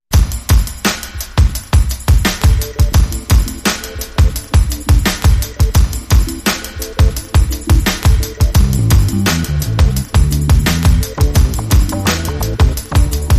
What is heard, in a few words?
Cooking Retail